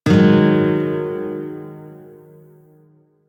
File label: Shawzin Yo Chord E; Music; Warframe